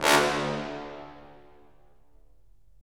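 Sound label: brass cimb, Roland; Roland LA Composer vol. 4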